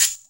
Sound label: Shaker; Equipped Music; Loungin House